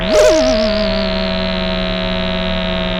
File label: Sound of a school bell